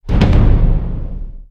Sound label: Arena Intro Stinger